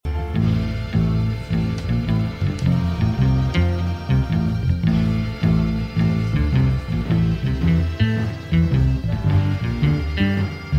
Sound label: bass